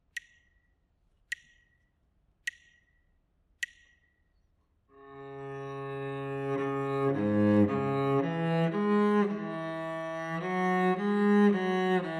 melodic error detection

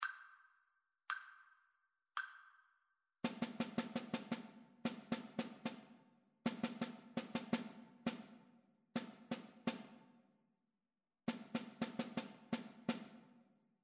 rhythmic dictation

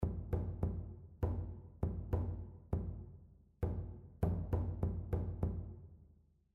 rhythmic error detection

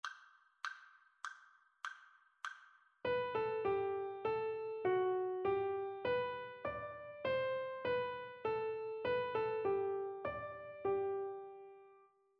melodic dictation; ear training

